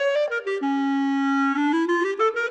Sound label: Clarinet; Sonic Foundry (Sony Creative Software); Orchestral VOL-2; Modern 29